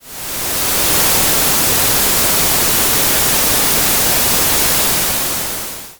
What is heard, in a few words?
ambience rain